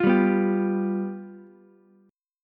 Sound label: F Suspended 2nd Flat 5th Ukulele, chord; strummed; 10,7,7,10; Fsus2b5